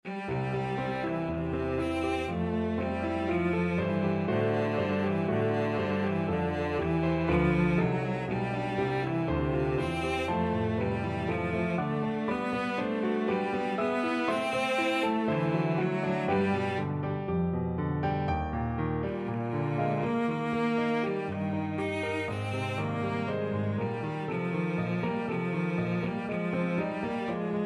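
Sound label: violoncello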